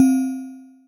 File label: Magic Orchestral Bell; Mallets; Ableton; Factory Packs; Synth Essentials; Collision